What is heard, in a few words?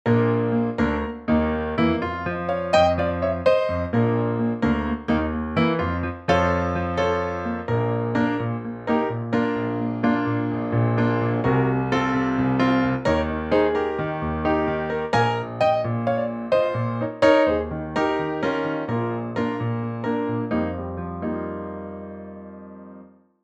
piano